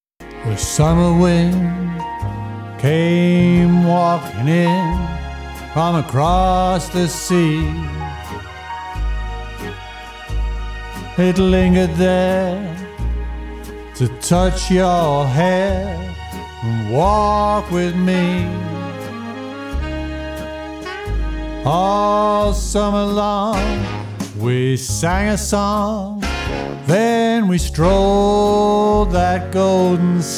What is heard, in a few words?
singing, Crooner Jazz Singer